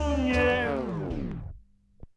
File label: Turntablism; Sonokinetic - The Roots Drumloops